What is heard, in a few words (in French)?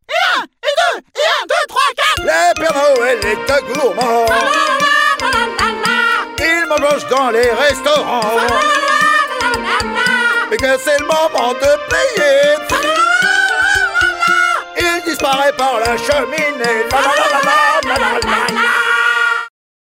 Chant noel; Nickelodeon